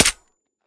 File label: mech, glock; Urban-Coalition; Decay; arccw ud